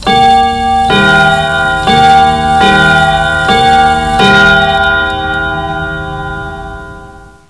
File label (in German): Bimbam; Bimbam3Stab